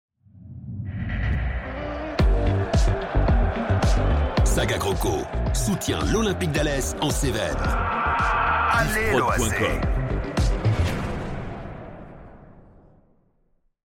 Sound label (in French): Jingle; Canal 30 SagaCrocos; OAC